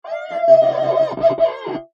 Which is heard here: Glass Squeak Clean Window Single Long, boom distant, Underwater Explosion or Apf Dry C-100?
Glass Squeak Clean Window Single Long